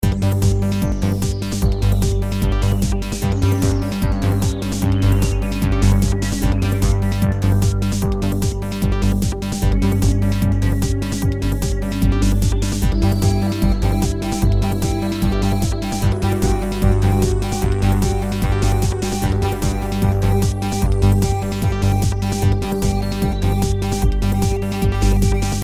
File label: music ingame; CAC ASSETS